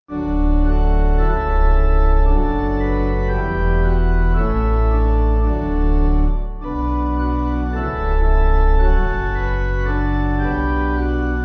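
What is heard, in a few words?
Pipe, Pipe Organ backing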